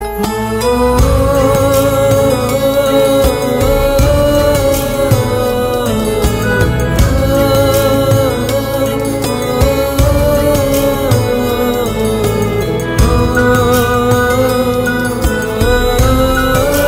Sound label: Ringtones BGM, Tamil; Ka Pae Ranasingam